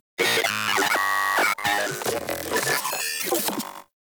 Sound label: Vocal Idle; Moa; Vocal Set D